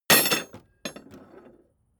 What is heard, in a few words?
metal tools drop; soundpool